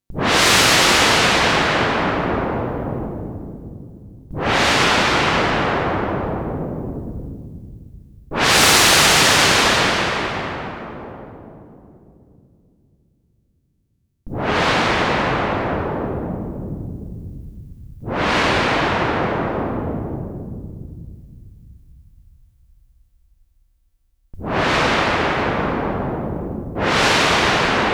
Welle 2600

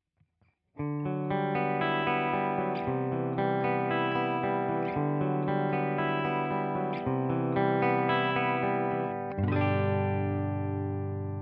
Boss Nextone EL34 CLEAN

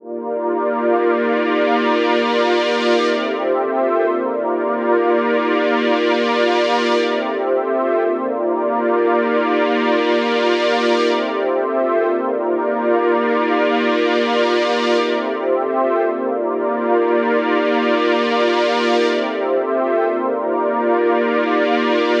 Pad Chorus 120 C; Dirty Little Pad; SDD320

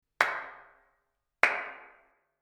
Claps Chamber

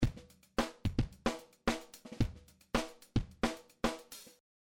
Drums Dirty

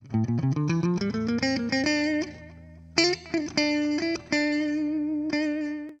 Gtr Low Cut Presence ON; WA EQP

